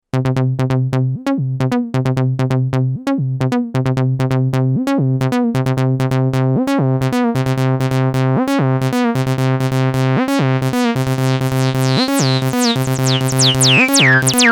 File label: Factory Pattern, Korg Volca Bass